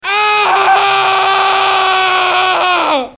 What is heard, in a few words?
aaarrrrrgghhhh